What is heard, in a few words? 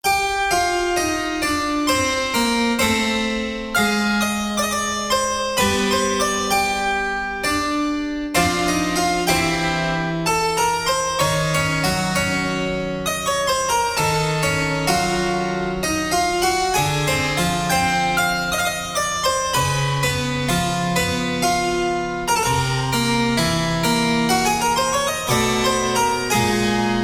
Game Over; Unused